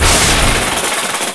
hornet buzz; svencoop; hunger

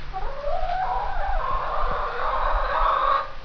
chicken idle; svencoop; hunger

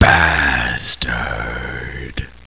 zombie attack; svencoop; hunger; zombienew

